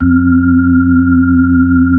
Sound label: B-3, Brite; Brite Xfade